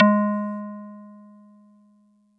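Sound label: Marimba DX100 G#1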